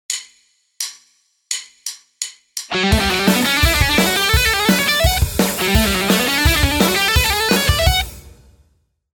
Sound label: mega lick fast